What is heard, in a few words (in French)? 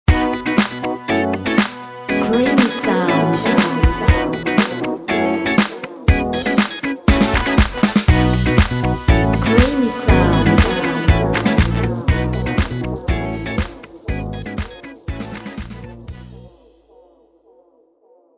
creation musicale happy funky